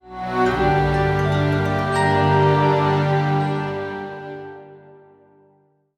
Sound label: Play Stinger